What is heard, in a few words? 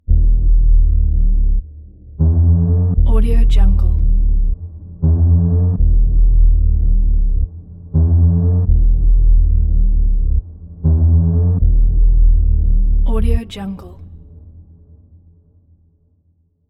bloody nightmare horror pulses; soundmorph